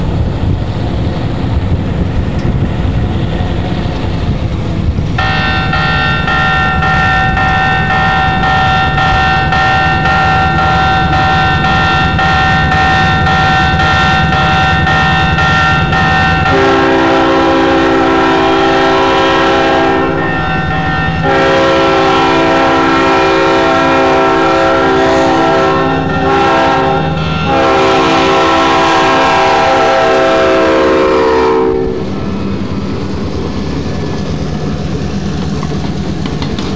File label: pass train